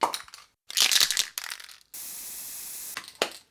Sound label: Spraycan